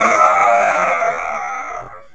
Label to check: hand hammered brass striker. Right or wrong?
Wrong